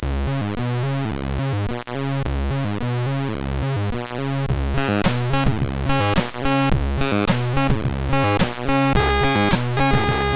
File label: intro, sid CSG8580R5; Mermaid